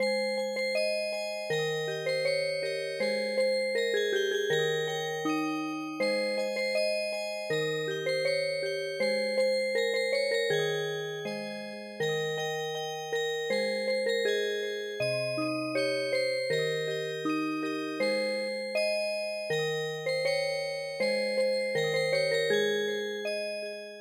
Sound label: Wiegenlied Music Box, midi traditional